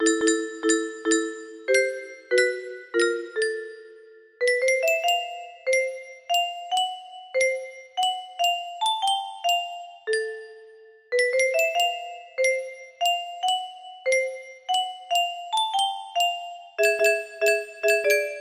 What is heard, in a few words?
acoustic grand piano, music box melody